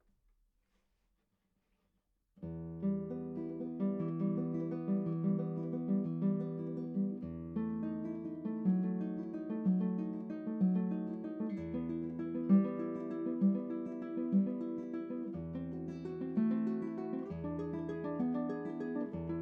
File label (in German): Harfenetüde